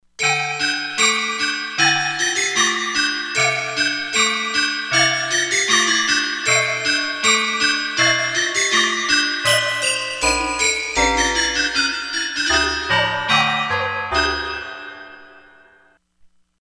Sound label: ShiverBell; Environment